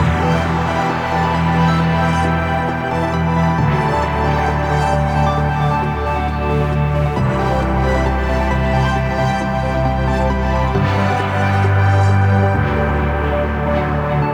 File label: Music Menu; sayonara wild hearts 2019 gamerip; Dead Of Night